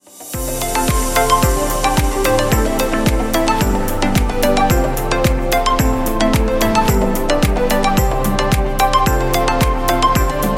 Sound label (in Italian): sveglia samsung